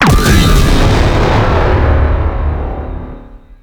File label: Glaive Detonate Explosion Large; Warframe; Glaive Ghost Catcher